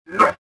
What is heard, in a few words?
choke